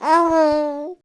bebe, sons humanos

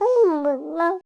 bebe, sons humanos

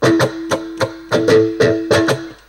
percussive, loops guitarra; loopasonic

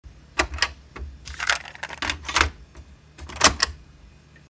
fita cassete, Sons domésticos; pacdv

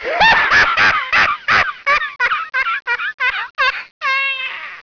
risada, sons humanos

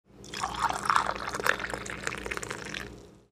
servindo, sons domesticos

